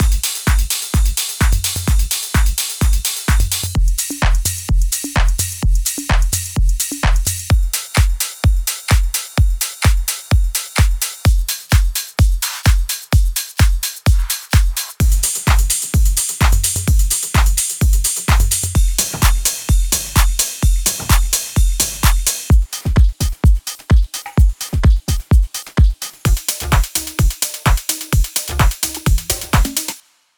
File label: EDM Drum Loops; Live Aspects; 50 EDM Drum Loops